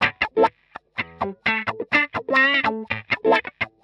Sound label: 125 wah A, wahwah; PowerFX - Nu Jazz House